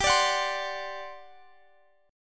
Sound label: G Major 7th Suspended 4th, G, down, Guitar, Standard; strummed; capo=3